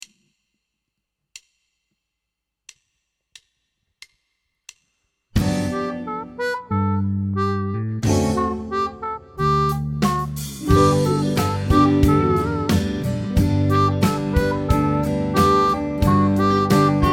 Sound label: harp; G